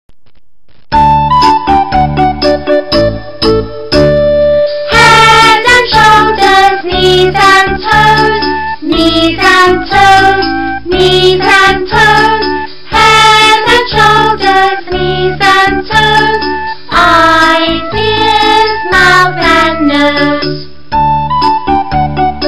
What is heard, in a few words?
song